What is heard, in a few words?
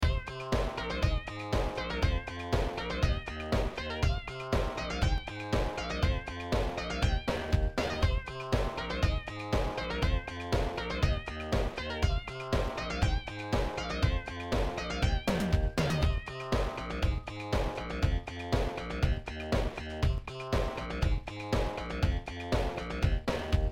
bass basstone lead sound beat